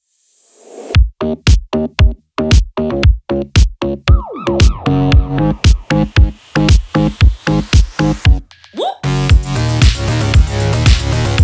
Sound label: synth pop